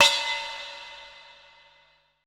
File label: E TIBET